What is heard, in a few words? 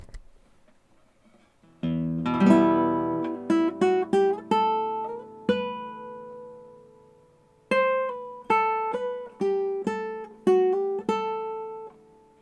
Spanish Improv